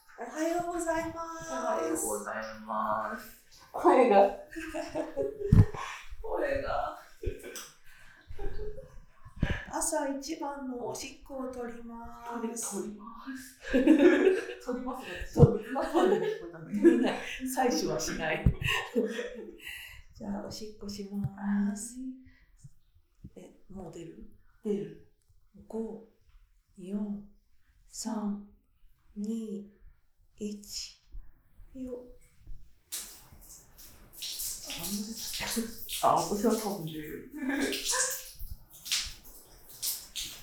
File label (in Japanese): 朝どりで匂うおしっこ; 百合企画やまがた編